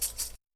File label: Shaker; Drums; Ableton Live 12 Lite Core Library